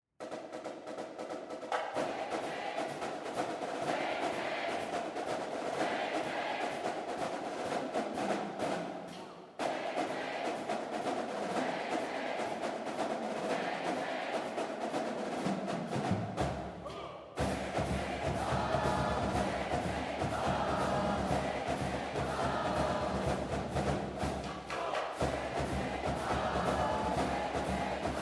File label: Drum Cadence; Southern Miss